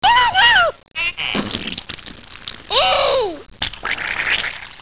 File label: diarrhea